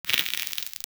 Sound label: destroyed skeleton bone; pixel